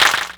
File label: Clapbox Pitch; Ableton Foundation FreePacks